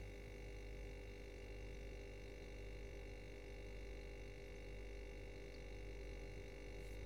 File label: refrigerator